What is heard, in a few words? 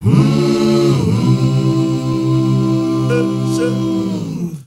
HUMMR, MMM CHRDS; Spectrasonics; Heart of Africa 2